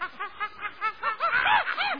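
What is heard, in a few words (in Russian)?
две обезьяны начинают драку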